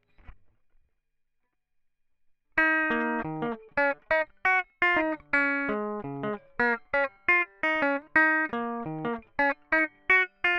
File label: strumming; logic